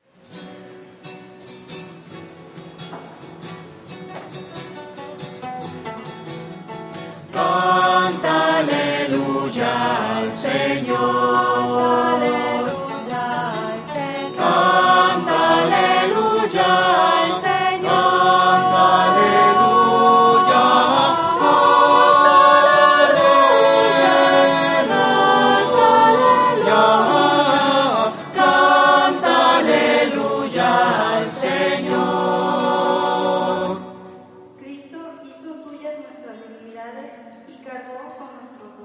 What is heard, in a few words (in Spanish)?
canta aleluya voces